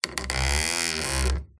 Stress Creak Squeak Groan; PMSFX

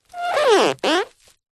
plastic cellophane sheet rub squeak rustle; foley

